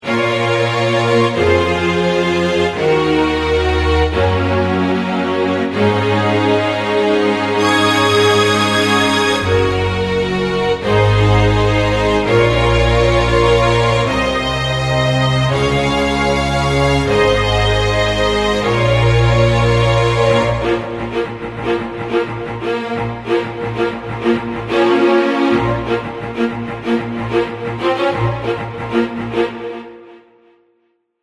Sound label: STRINGS